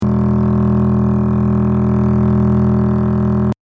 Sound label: E bass; tuneguide